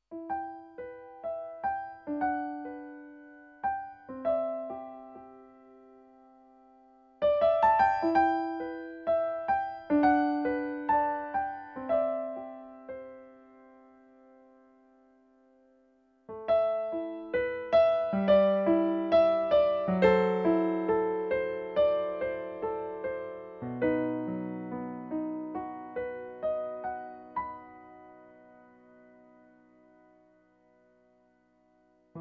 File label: Piano